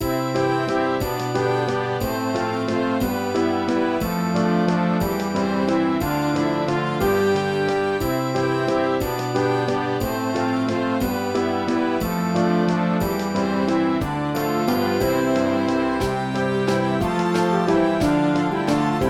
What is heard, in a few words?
Waltz